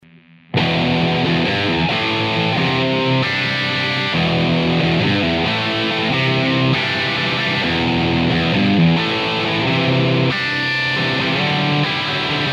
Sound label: JCM800 4x12; podxt